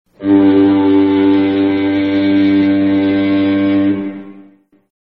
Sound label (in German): schiffshorn